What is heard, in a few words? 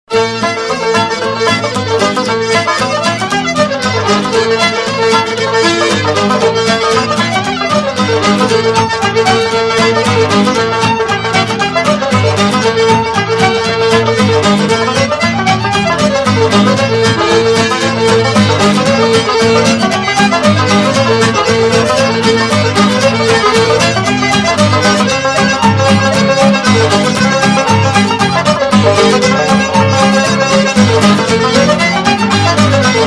Irish Reel